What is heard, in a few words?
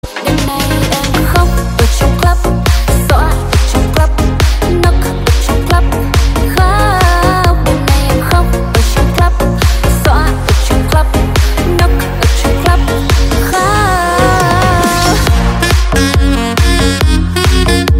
remix